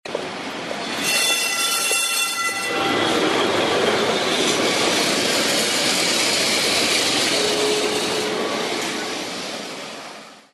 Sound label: Tram Lissabon